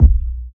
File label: kick drum sound a sharp key, kick drum single shot tuned to the A# note; kick drum samples